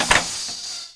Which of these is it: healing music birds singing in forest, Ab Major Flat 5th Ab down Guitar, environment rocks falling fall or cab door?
cab door